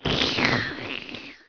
leech suck; Emperor - Battle for Dune

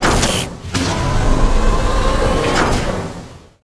Blast Door Close; Ambient; Sid Meier's Civilization 4 Complete; Beyond the Sword

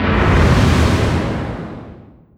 distance ship miss small effect; Hearts of Iron IV Death or Dishonor

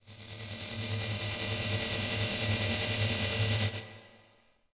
Goodyhut Electric; Ambient; Sid Meier's Civilization 4 Complete; Beyond the Sword

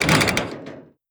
edit naval orders; Hearts of Iron IV Death or Dishonor

